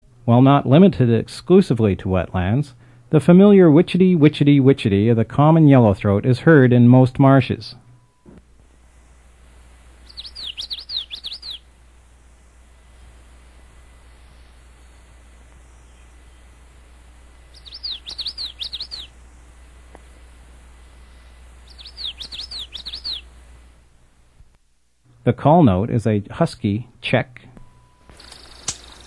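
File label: Common Yellowthroat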